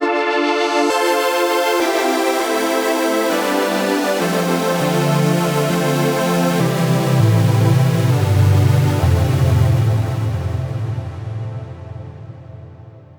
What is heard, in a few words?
Pad